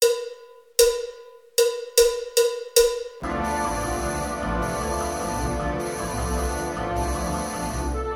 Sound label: Spanish Dance